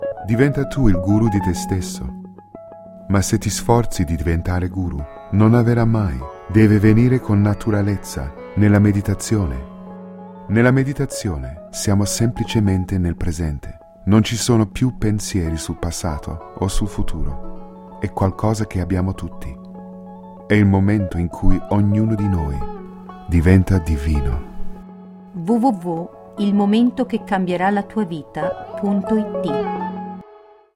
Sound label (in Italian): meditazione